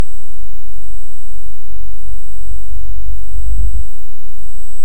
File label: Hydrophone; OneOcean